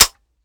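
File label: akilo47 fire first player, weapons; viper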